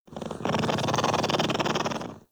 Pet Purr; Enemies; Warframe; Catbrow; PetShip

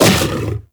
Kubrow Armored Vocal Attack Short; Warframe

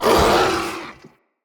Corrupted Battle Dog Pain, Animals, Vocals

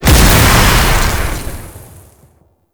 Incarnon Wrist Gun Alt Explosion, Weapons; Warframe; Tenno

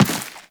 Run Dirt, Footsteps; Warframe; Teshin

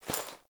Body Move

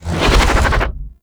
slash dash; Warframe; Excalibur

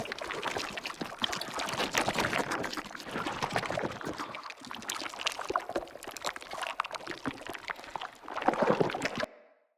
Corrupted Slug Foley Movement Loop; Creatures

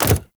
Land Default; Warframe; Pets; Zanuka Companion

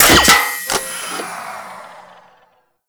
Ogris Mech, weapons

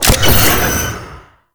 Owl Recon Aim Connect Start; Warframe; Duviri Murmur

